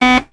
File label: error, ships